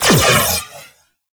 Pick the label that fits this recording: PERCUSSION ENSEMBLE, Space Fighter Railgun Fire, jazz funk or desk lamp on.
Space Fighter Railgun Fire